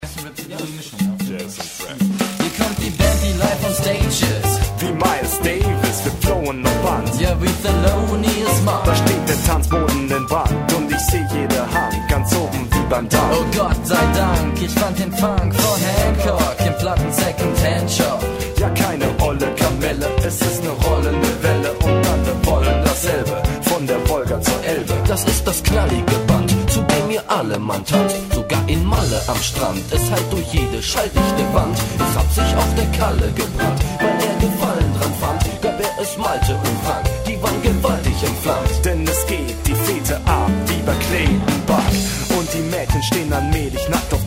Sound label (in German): jazz rap